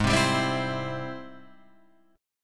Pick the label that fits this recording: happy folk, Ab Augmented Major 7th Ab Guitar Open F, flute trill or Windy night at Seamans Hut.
Ab Augmented Major 7th Ab Guitar Open F